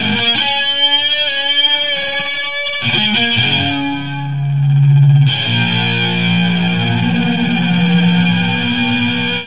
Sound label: in my basement guitar break; GUITARS